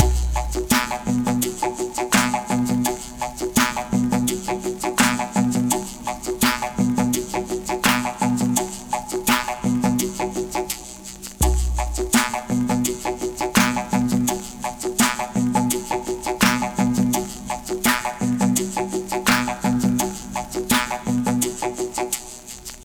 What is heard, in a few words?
84 bpm, Breaks; POTBreaks